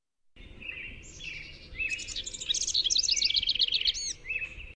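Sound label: House Wren song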